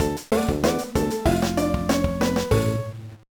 Jingle, gba

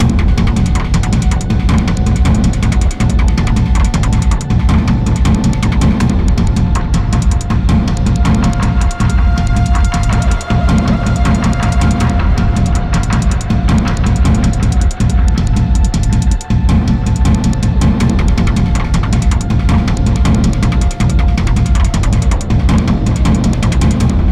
Alone Suspense

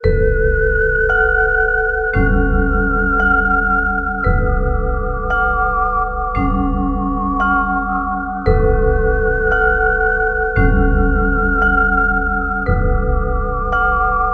Sitar

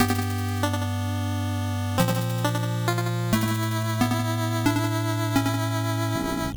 Stereo, Game Over

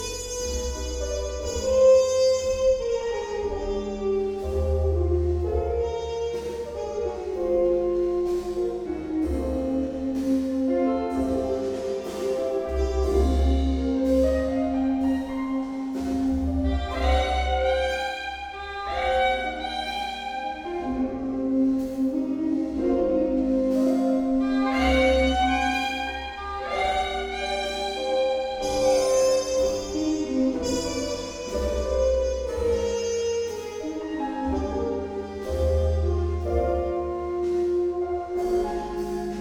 Midnight Jazz

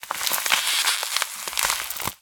frozen; Scrat

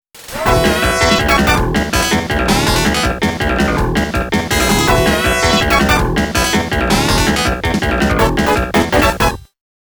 Completion Fanfare; Rad Mobile Original Soundtrack

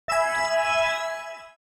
Revive heal

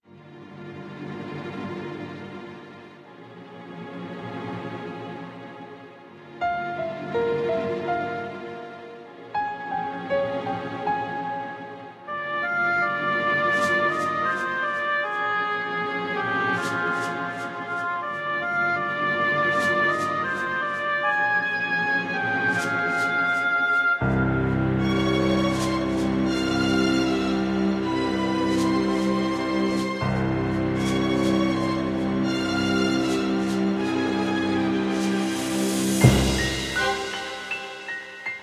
Dungeon; Alphadia Genesis 2; Android; PS4; Xbox

